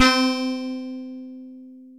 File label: Ancient Far East, synth keys; Instruments; Ableton; Factory Packs; Synth Essentials; Collision